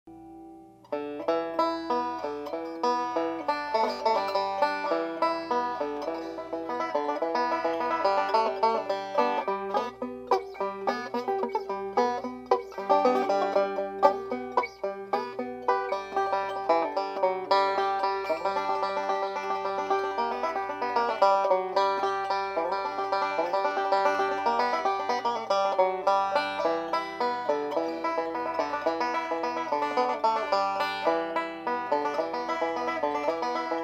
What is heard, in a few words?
fiddle, banjo